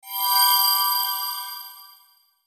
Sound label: Musical Shimmer, Musical Shimmer High; Musical Sound Design; Blastwave